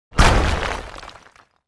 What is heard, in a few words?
heavy rock ruble pile impact or crash